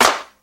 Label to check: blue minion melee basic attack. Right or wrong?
Wrong